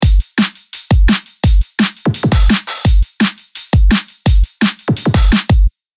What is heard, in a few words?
Stained, 170bpm, Drum Kits